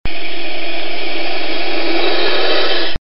tieng rit cua ran ho mang

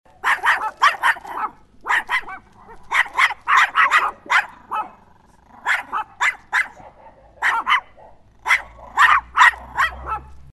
tiếng sủa của vài chú chó con ở nơi trú ẩn dành cho chó

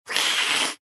tieng meo rit

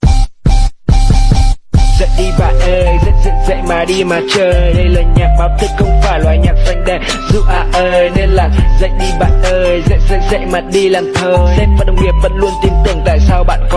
nhạc chuông báo thức

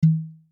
kéo nút gỗ nắp cao su ra khỏi chai

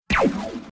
am thanh tat tv

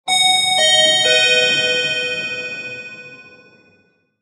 tieng chuong thang may thong bao den tang

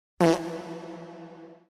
tieng xi hoi danh ram vang vong

